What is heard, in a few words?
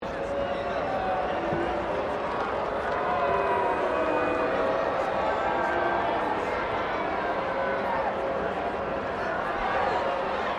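crowd 15K Rugby World Cup boo, Rugby world cup game; Crowds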